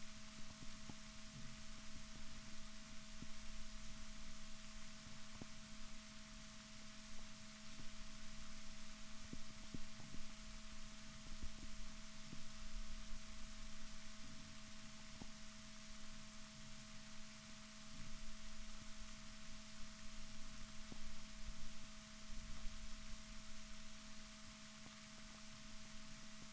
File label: rorquals negatif, Balaenoptera Physalus Chile